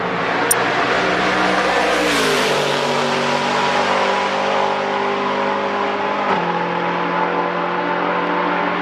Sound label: Nissan GTR GT1